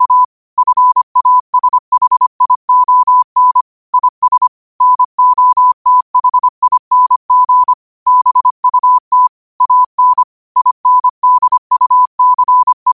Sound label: 5 wpm, morse; Daily Quote